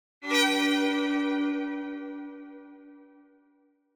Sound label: Symphonic Strings Happy Solo Finale; Hollywood Edge; sound designer's toolkit v2